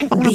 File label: voice funny cartoon misc